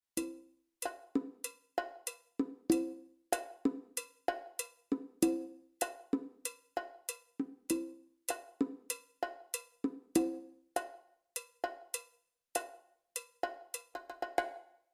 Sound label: Sound FX & Mix COMEDY SCENE